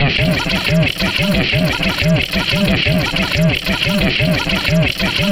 090bpm, Downbeat Dance Grooves

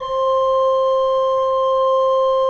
C4, Cathedral Organ, ROHRFLOETE; Propeller Island

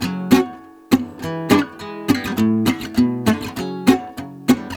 GUITAR, Balkanish Traditions; USB Soundscan vol.63; AKAI

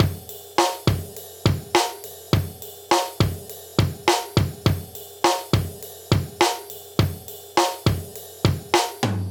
103 live breaks

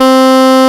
FM TENOR C4, horns; AKAI S-Series CD-ROM Sound Library VOL-2

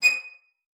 D#7, VIOLIN SPICC; Xsample VOL-5

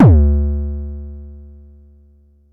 Bassdrum, Jomox Xbase09

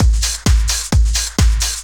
dr t 130 trance 2; LOOP TRANCE; DRUMLOOPS

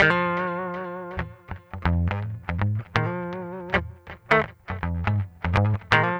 GUITAR; East West

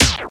HIT, STABS, House

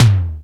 Linn-Drum; Best Service Gigapack 2

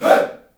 HEY, SHOUT; Spectrasonics; Heart of Africa 1; CH. SHOUT1

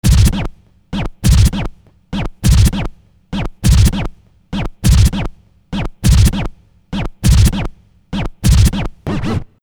Scratch, Hip-Hop

